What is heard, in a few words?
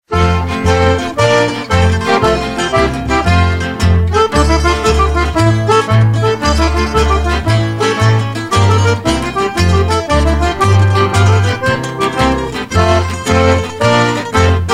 Yo Ho instrumental; Pirates of the Caribbean; Xbox